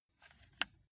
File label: console controller joystick move single